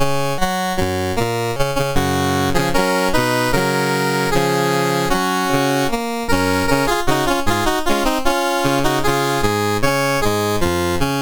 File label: sid MOS6581R3; Games Summer Edition menus